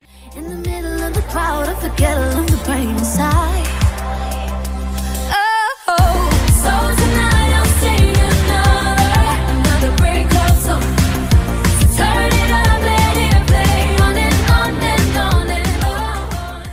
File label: Ringtone